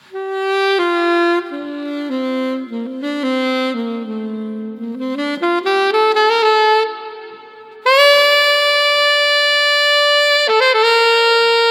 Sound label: Master Room MR-IV - Saxophone; Nevo Studio Springs